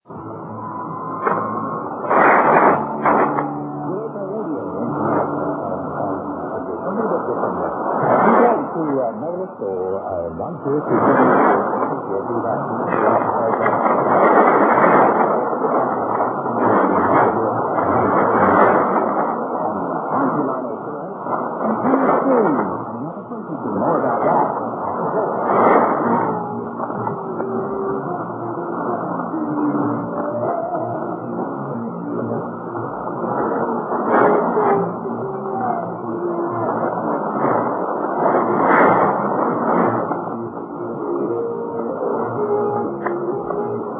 9290KHz; ERI

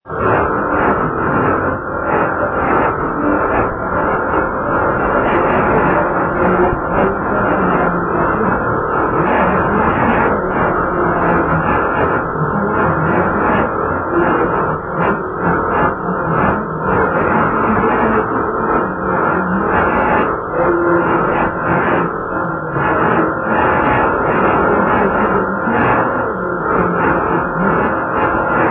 5010 KHz; R Malagasy

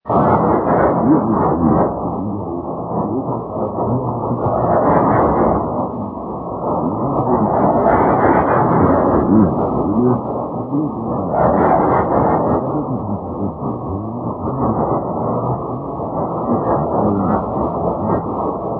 radio, 6005 kHz; DeutschlandRadio

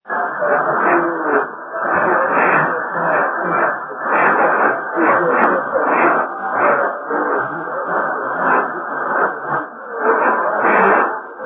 4777 KHz; R Gabon

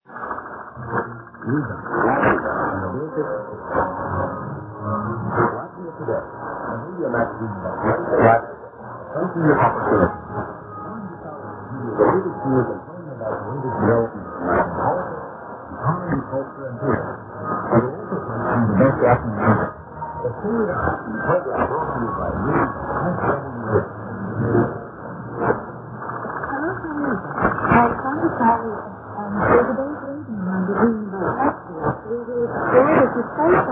Radio 9290kHz

